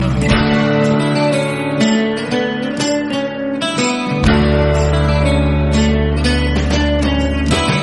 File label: modern nature sound musical tone ringtone